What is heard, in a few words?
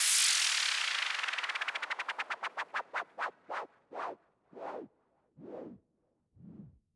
Downlifter; 99Sounds x Monosounds